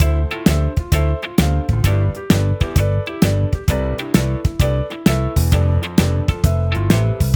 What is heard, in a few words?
4 4 shuffle